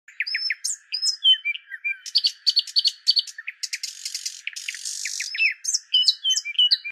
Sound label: bird chirping sound effect